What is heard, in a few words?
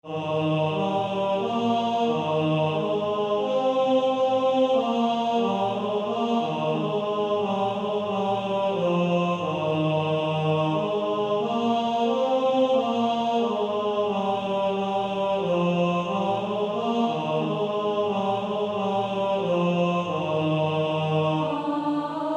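Male Choir tenor 2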